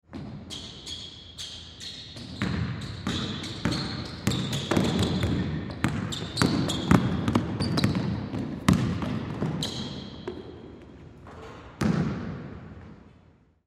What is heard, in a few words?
Basketball Game Ball Bounce Hard Shoot Shoe Squeaks; Sports; Blastwave